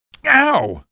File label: pain, male